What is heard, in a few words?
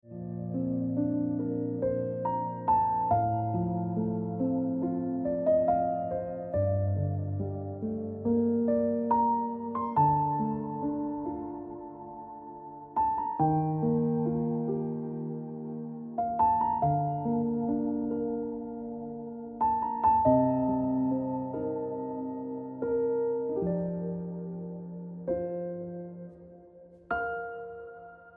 Piano Music